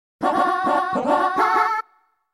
Game Start, "Game Start" theme, plays on the star select